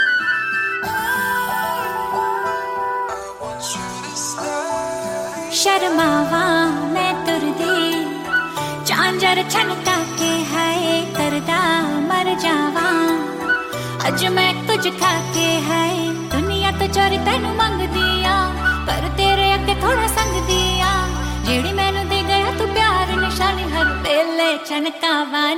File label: hindi ringtone